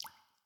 Cave Random Drips; The Inn

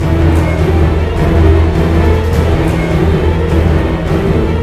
Amb Vortex; Alice in Wonderland; Nintendo Wii

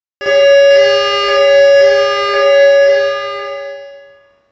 Alarm

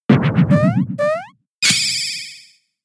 Metronome; pokemon sfx gen 6 attack moves xy oras

